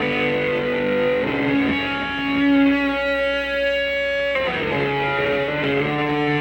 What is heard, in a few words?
Burn Down, Electric Guitar; Sonic Foundry (Sony Creative Software); Friendly Fire; Wrath